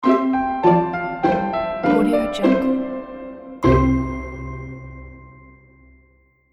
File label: the classical logo